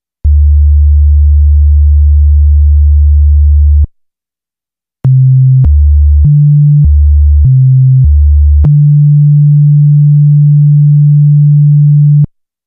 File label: Nord Lead 2 Raw Sine Wave; Nord Demos; Nord Lead 2 versus 3